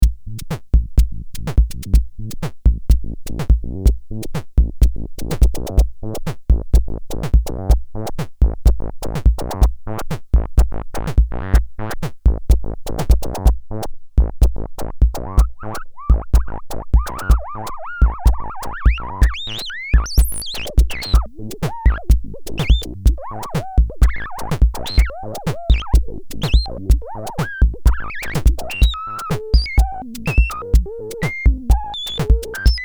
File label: S&H Filter Modulation; L.E.P. LEPLOOP V2